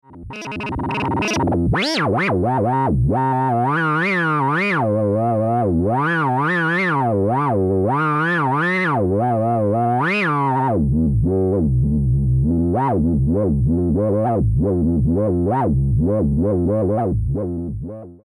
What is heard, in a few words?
psycho pulse filter